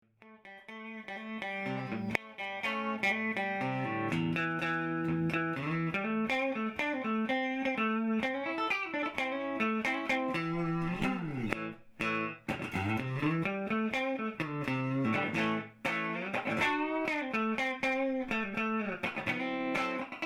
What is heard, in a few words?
guitar